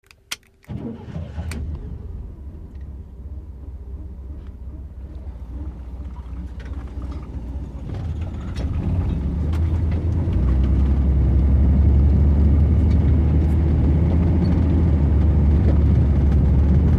download Sound, Chevrolet Corvair, Interior Pov, Start, Pull Away Slow, Steady Driving with Interior Rattle, Decelerations / Accelerations Through Second Half.